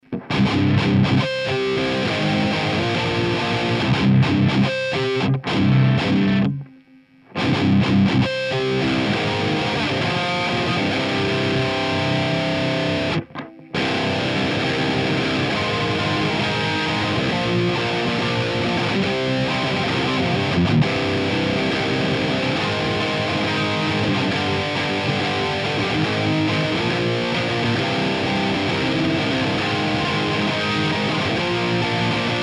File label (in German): Laney VH100R Rhythmus